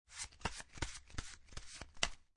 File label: Cards Playing Cards Shuffling; Playing cards, poker, shuffle. Shuffling cards.